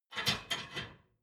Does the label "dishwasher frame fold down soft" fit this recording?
Yes